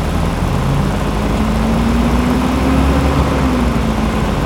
Vehicle Jeep Run

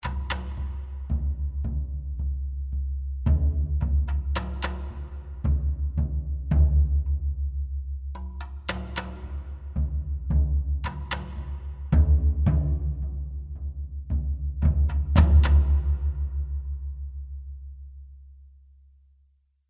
Combat Suspense; The Last of Us; Cannibal Combat 1 Suspense Chunk

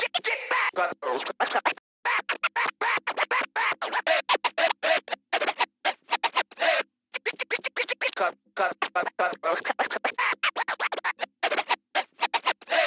90 hiphop 118bpm surf continuous; gamerip; Hot Lava

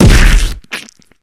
Kill Hitsquish; Friday the 13th: Killer Puzzle

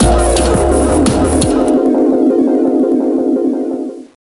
Industrial Crash

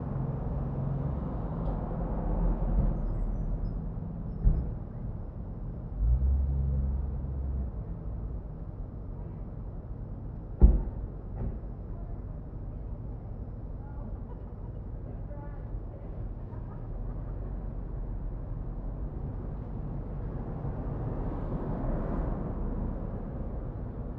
outside Noise

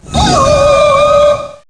SIREN CALL; Claw

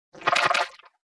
Btl Con Mud; The Denpa Men; Android

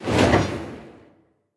Tank Scorching Loopend

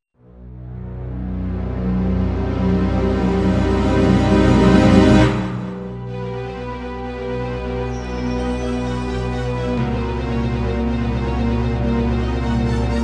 Fishman Attack; Castlevania 64; N64

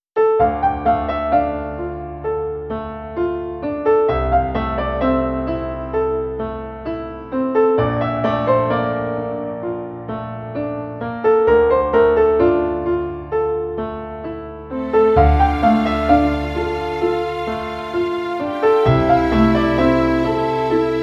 Romantic; Sea Dogs To Each His Own

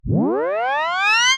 Tape Start

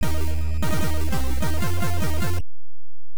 Unknown Jingle; overlord nes

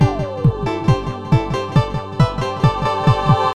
SHOOT; Mario Artist; Polygon Studio; N64; Sound Bomber

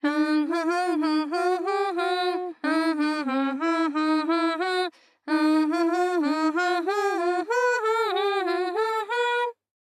Voice song, ja

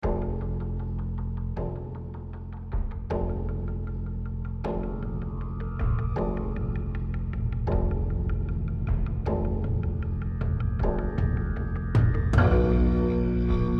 Rage Combat; Joel

